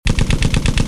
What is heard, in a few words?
Machine gun; bruitage; Armes